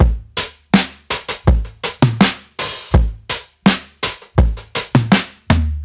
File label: tom groove 82